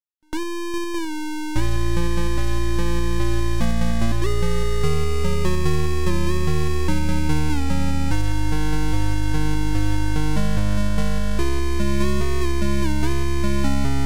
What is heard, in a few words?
Intro Style Music; AFGANISTAN